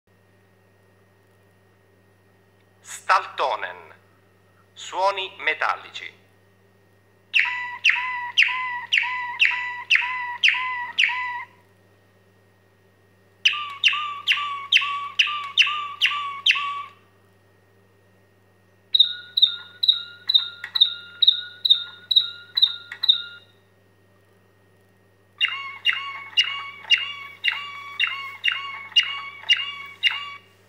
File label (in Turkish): Staaltonen, Malinua Kanaryası, Staaltonen, metalik tınılı ötüşlerdir. Cam çanlara benzer sesler çıkaran kuş; bu nağmeyle ötüşüne zarafet ve özgünlük katar